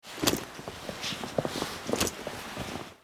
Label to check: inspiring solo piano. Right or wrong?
Wrong